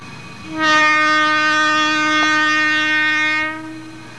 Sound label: horn